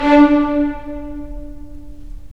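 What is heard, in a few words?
STR, strings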